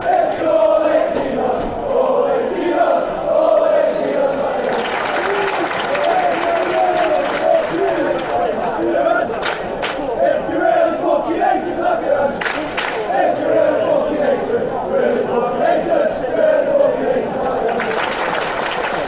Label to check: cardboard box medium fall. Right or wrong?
Wrong